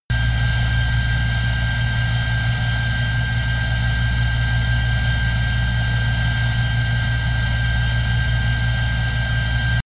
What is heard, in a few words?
plane bombardier learjet turbine idle close; flugzeuge verkehrsflugzeuge; verkehr transport